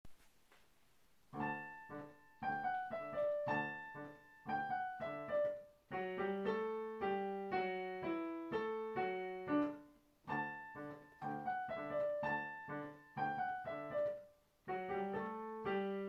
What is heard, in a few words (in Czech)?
klavir; Mussete